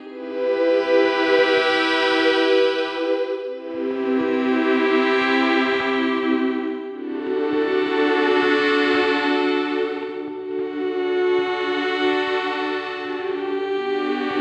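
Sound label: 70 pad G major; eularia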